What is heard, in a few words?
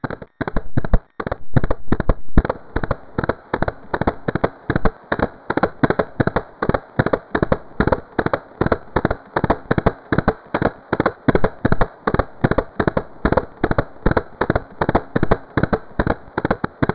Hoof Snd; Sir Richard The Lion Hearted